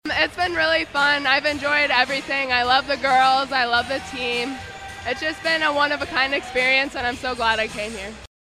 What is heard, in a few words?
whistle